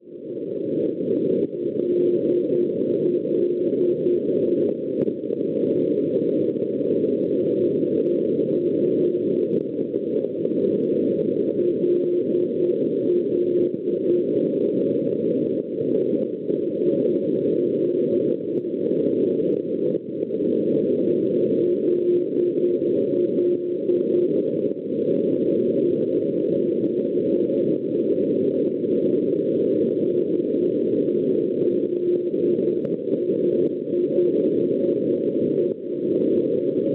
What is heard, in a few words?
NDB Leirin Norvegia 342 kHz; LL; Schwarzsee